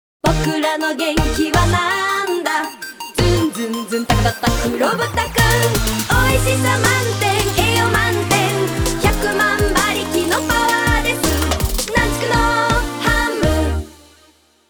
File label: dance